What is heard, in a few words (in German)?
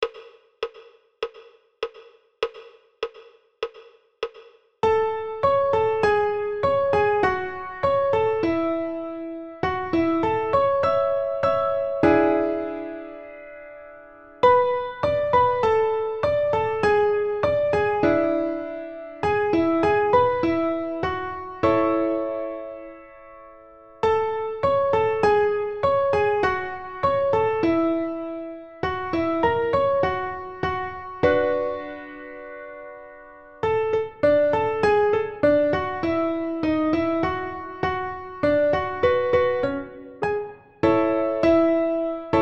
uebung 2 haendig rechts, piano